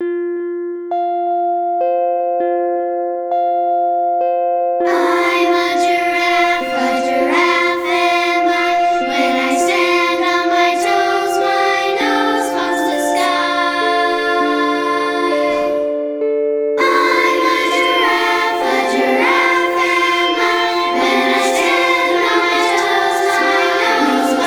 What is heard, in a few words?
Children's Choir